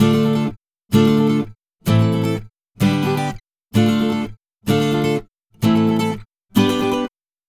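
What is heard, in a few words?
Acoustic Latin Guitar 128BPM D minor; Acoustic Latin Guitars; Vengeance; Essential Clubsounds Vol.4; Guitarloops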